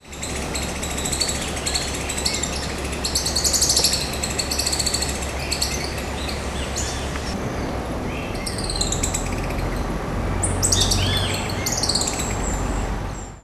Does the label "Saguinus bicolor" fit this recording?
Yes